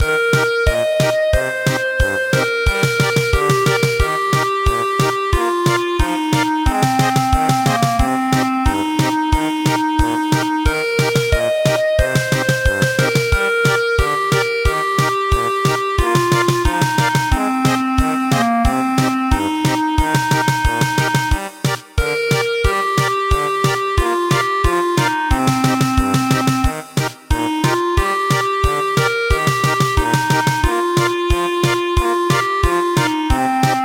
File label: German Polka